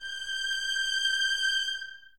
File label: Violins Detache G 6; Miroslav Vitous; 11 Violins